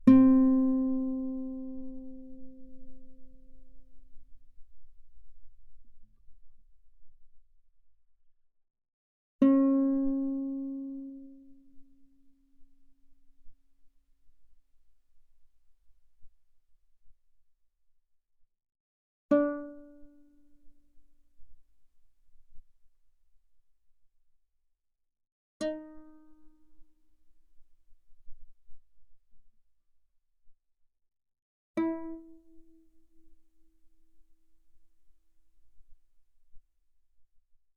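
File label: Guitar fortissimo sul A C4 E4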